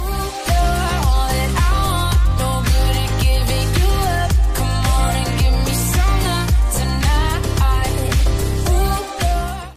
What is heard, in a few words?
remix ringtone; iphone 7 plus 2018